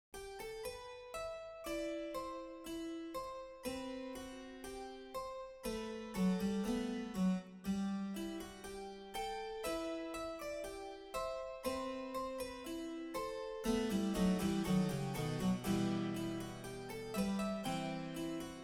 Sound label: The Sun Dappled Forest (for solo harpsichord) Prelude